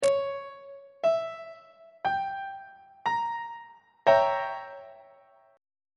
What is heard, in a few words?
a sharp diminished 7th 1st inversion; on treble clef